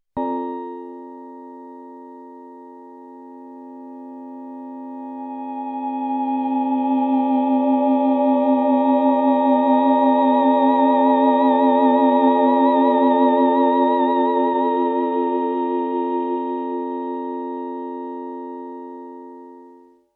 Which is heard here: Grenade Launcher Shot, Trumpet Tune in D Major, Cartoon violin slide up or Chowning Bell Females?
Chowning Bell Females